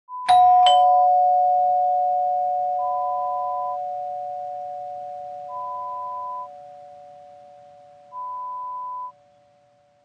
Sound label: Door Bell